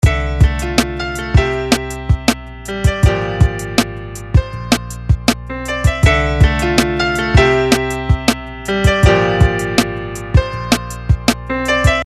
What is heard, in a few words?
beat piano